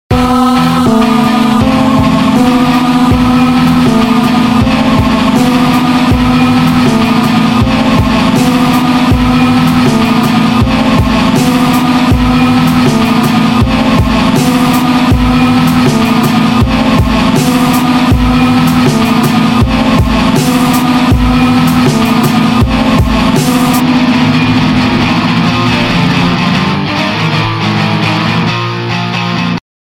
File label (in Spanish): GUITARRA